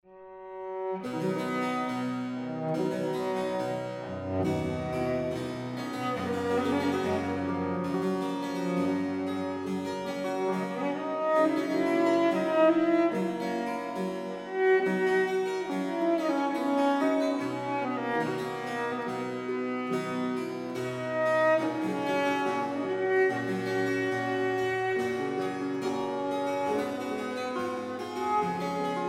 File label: Sonate a Violoncello Solo e Ba